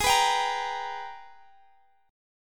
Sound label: Ab Minor Major 9th; AbmM9; strummed